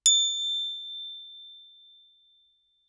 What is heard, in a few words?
Crotale ff A7 stereo; Percussion; Crotales